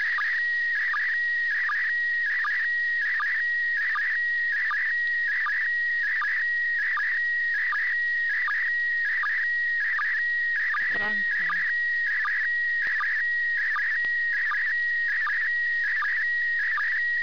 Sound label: iss sstv